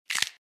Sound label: Finger bone break and snap